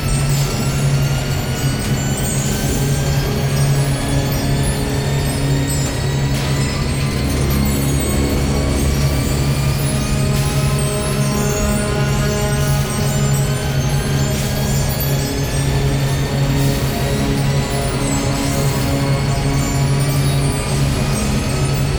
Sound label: big Win Loop; netent